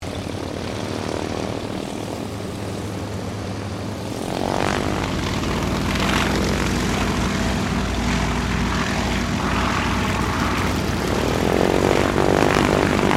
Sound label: 1977 YAKOVLEV YAK-52 SQUADRON PREFLIGHT, Russian Yak 52 Training aircraft; Airplanes; Vehicles